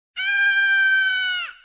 ahh

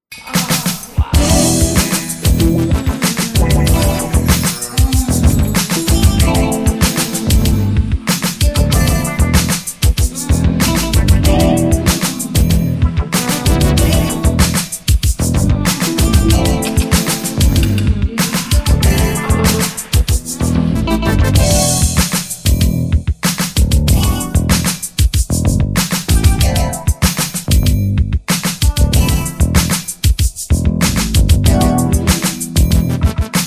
groove; cave

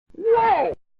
WOAH

